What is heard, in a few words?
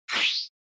Motion Agility Long